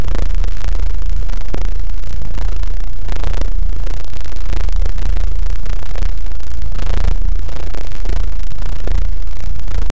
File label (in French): bruit de chaudron, cauldron